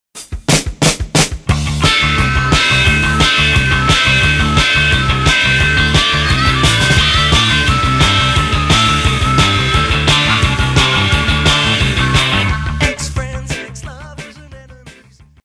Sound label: harmonica